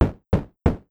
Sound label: door knock wood synth slow from the other side